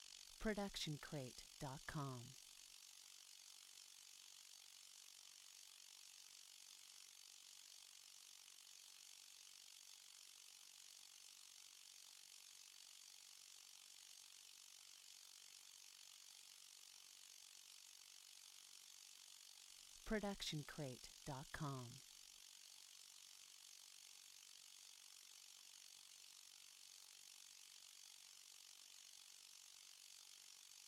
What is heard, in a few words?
hovering drone; soundscrate